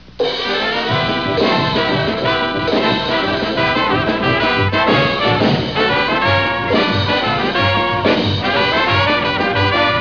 show intro music; The Bullwinkle Show, NBC, 1961-1964; Rocky & Bullwinkle

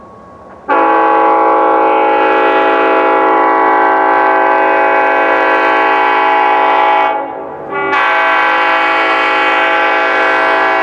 making noise (K5LLA); Meadow Glen Road, Kings Park February 2019; lirrhorns

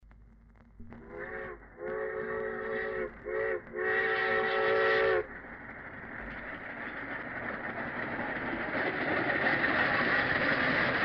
G-5 whistle, steam; Hicksville; Steam Sounds, Steel Rails

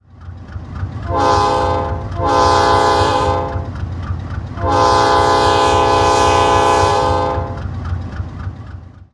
P5 horn; Nathan; lirrhorns

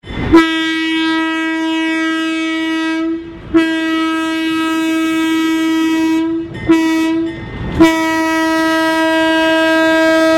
A 2 horn; lirrhorns

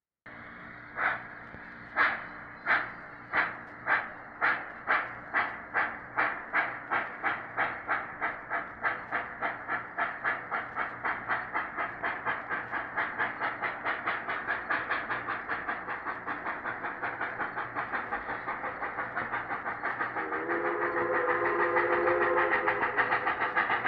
acceleration, Steam Sounds, Steel Rails; Mineola

